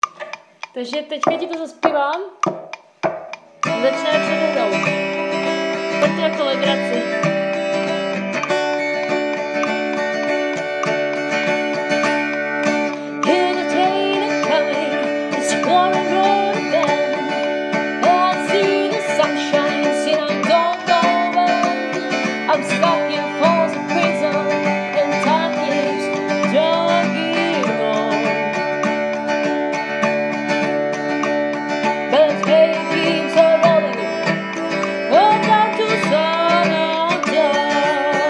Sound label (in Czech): zpev a metro